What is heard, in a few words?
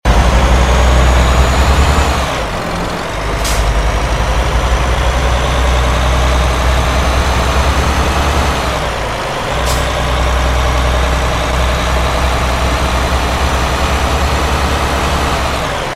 second, vehicles; TDMCarpack; TDMCars